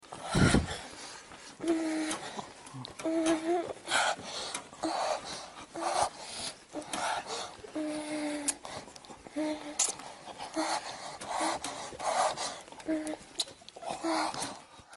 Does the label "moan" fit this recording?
Yes